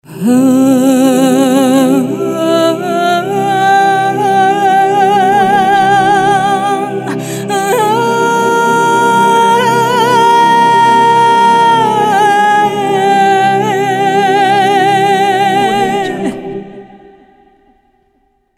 arabian woman voice logo

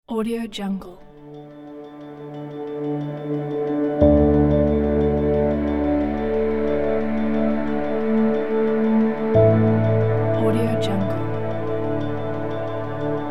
soft atmospheric piano

